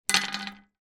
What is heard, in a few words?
stone down on metal drain cover; foley